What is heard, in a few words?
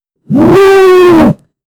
bear attack sound; danger